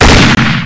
shotgun; Legal Crime

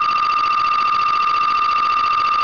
TRRRRRR

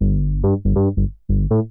bass E1; Techno; TechnoMusicMaker

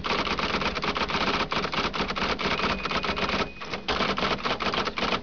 TYPING; Image Bonanza; Expert Software; ondajob

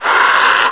attack; Hexen II

